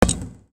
Football close; Nokia; audio themes

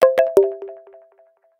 Grain, notifications; Vivo X5 Pro

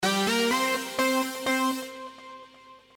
SOUND NEW EMAIL, phonetones

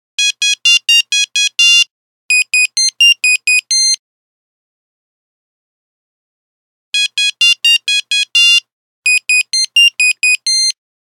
Nokia Buzzer; Windows Phone apps; 3210 Playground

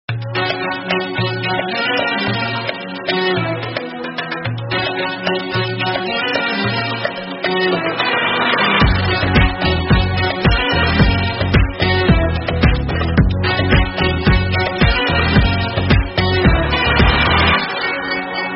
Oriental Cello; Sony Ericsson W950

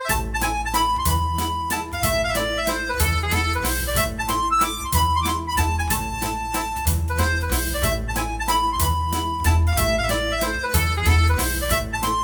Solitude Walts, ringtones

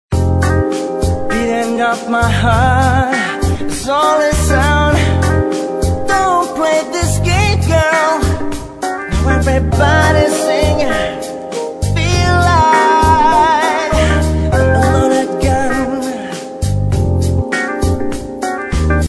Motown Dreams; Alcatel OT C55x PC Suite

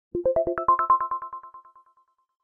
Delay

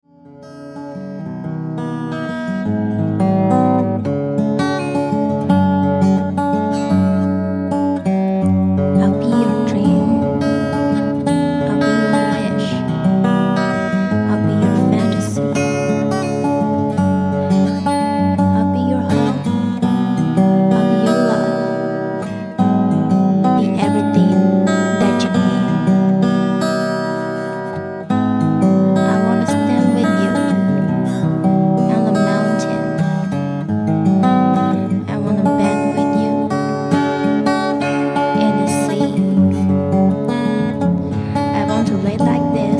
guitar; Sagem MY-V-75-CD

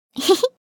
Laugh, notifications; Tecno Spark 40C

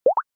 Full Battery, ui; Hisense; A5

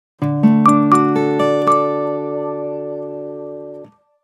Message, Nokia Alerts; Windows Phone apps

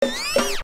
Hiphop open; Nokia; X5-01

